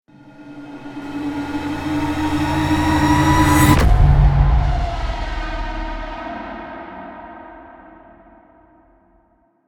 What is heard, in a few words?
Horror cinematic string riser